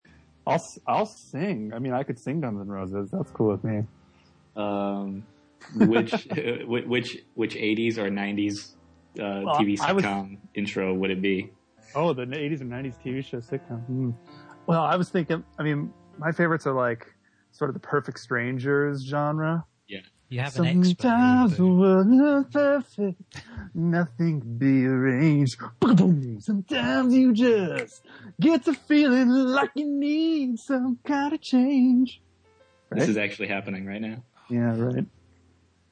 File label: singing; Roundtable Podcast